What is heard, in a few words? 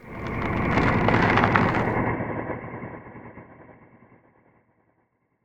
Creak; Barotraumatic; NightmareCreatures; StationCreatures; Husksprinterold